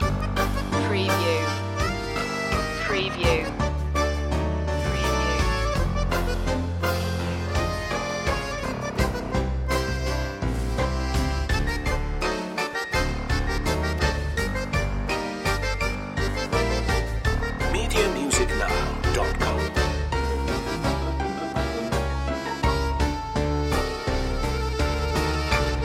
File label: circus tango loop